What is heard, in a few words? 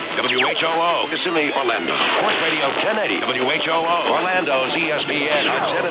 whoo